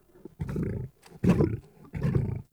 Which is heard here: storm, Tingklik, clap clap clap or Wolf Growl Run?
Wolf Growl Run